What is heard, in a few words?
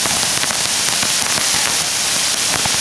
match loop; ANTHILL; XBOX; AntzExtremeRacing